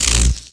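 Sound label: shock, magic; The Elder Scrolls III - Morrowind; Morrowind GOTY GOG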